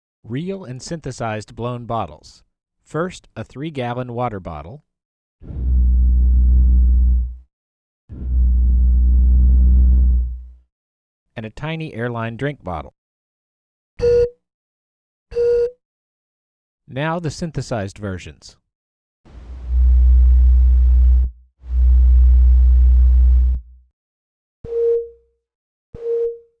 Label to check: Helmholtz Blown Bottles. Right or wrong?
Right